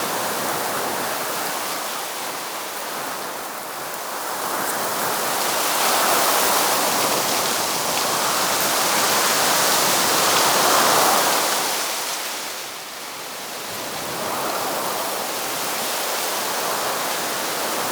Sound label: Vegetation Stereo Loop; Tau